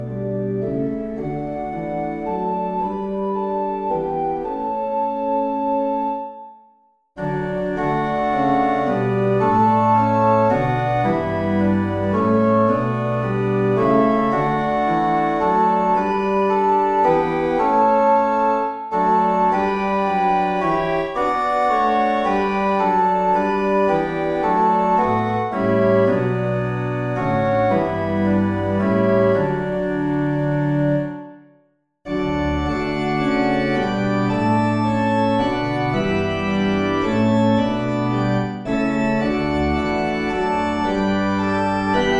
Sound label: Hymn, organ; We Walk by Faith and Not by Sight, Beati-Immaculati